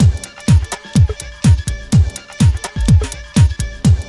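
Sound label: drum loop; X-Static Goldmine 3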